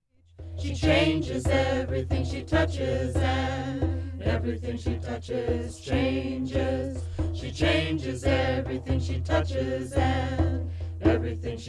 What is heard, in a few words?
Chants